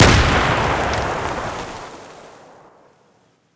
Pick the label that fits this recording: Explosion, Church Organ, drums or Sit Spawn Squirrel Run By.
Explosion